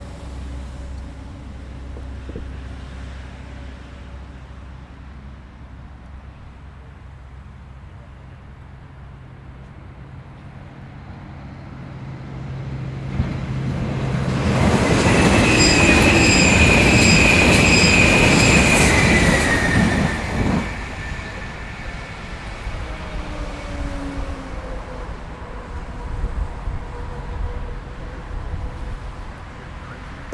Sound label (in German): bahnlinie mit zug